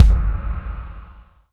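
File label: bass drum, left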